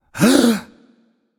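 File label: non verbal breath, voice; cernunnos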